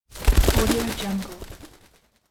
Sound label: bird wing flap